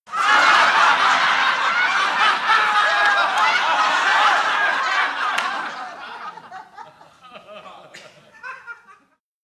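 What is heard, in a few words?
Crowd Bursts Laughter, medium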